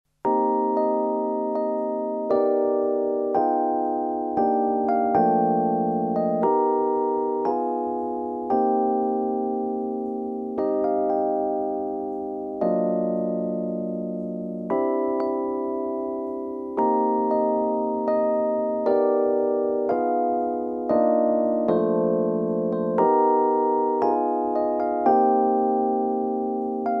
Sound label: mark 1b stage 1979 normal, fender rhodes